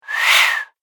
Whoosh Breath; Whoosh Sound Effects; sound design

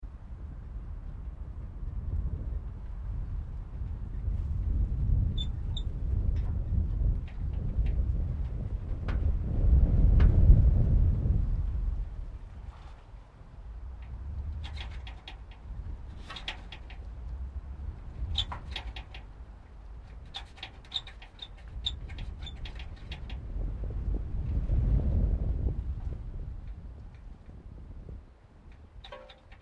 METAL CREAK LARGE; Materials

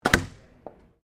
GUN TOY DART LEVER ACTION FIRING; Toy Guns (Sound Effects:Toys)

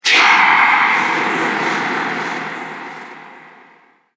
Fright Monster; Monster Sound Effects; Fantasy

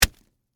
Impact Celery, Texture, Impact, Celery, Vegetable; Texture, Impact, Celery, Vegetable 4; Miscellaneous Impact

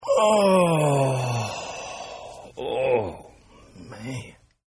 Groan Male; Groaning; Voice

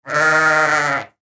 Sheep Baa; Animal

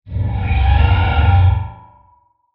Dark Scary Creature Transition Creepy; Scary Soundscapes; CraftedSignals

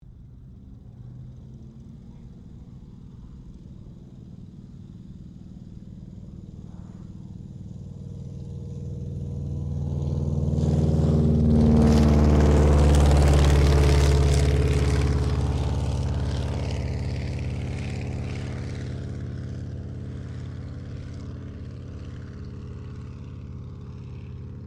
AIRCRAFT AVRO 643 MKIA CADET TAKEOFF, Biplane; 1934 Avro Cadet Biplane; Airplanes; Vehicles

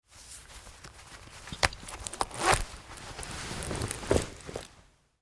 Jeans Take Off; Clothes; Dressing; Human; Blastwave; Foley

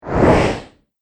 Big Dragon Wing Flap; Monster Sound Effects; horror

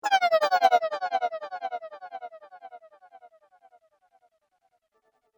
Game Falling Falling, Video Game Sound Effects

